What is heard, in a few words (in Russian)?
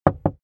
dva udara palcami; Звук стука в дверь